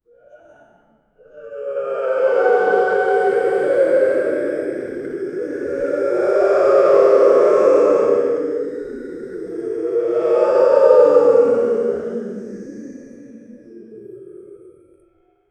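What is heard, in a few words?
a crowd of toons whimpering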